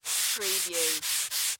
Dry Erase Board Erase; Sound Effects Bible

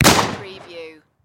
Shotgun Winchester 1897; sound effects bible

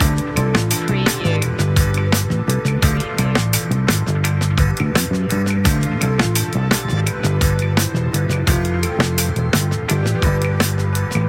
floating on air loop

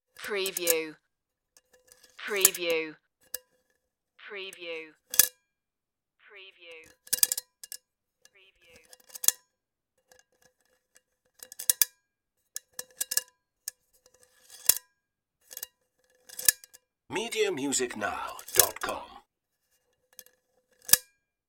metal hinge rattling

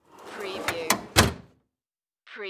Toolbox Drawer Close

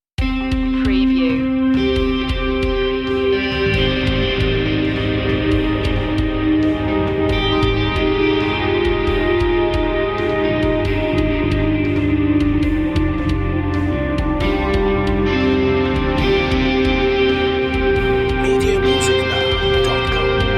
tones

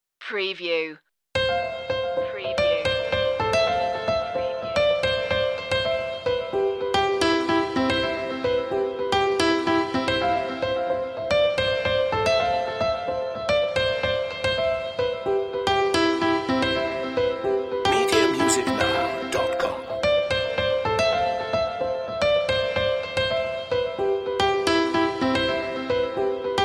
groovy clouds piano only

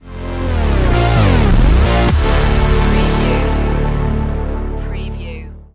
block party stinger

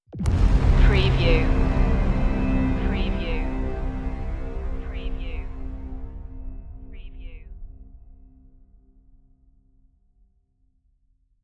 alien invasion stinger

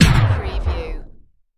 Turntable Scratching Stop Brake

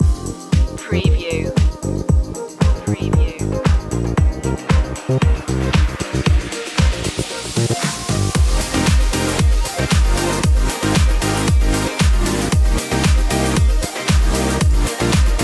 start up groovin

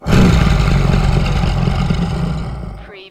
dog monster snarl

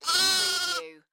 Lamb bleating; beanstalk audio

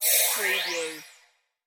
Magical Shimmer Flange; Magic / Spells / Fantasy

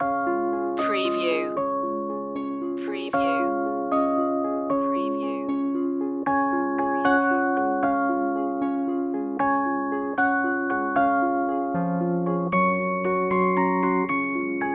instr loop; three blind mice

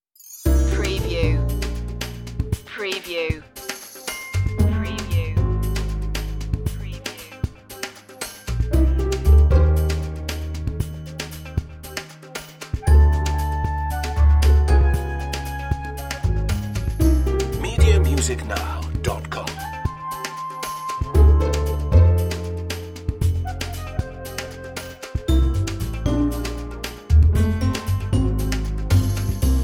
life style tv light comedy

